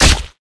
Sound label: ursa attack